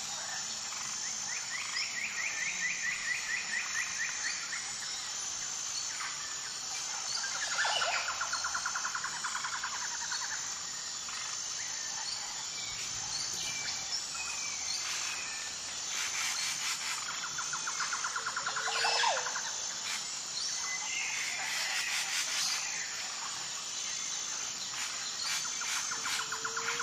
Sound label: Jungle Sounds